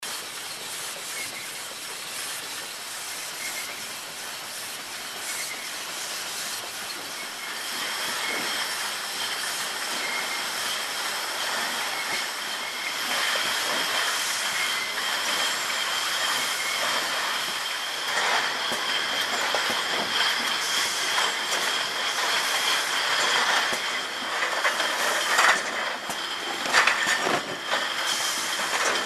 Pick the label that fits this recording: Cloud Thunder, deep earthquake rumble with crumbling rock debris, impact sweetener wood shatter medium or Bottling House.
Bottling House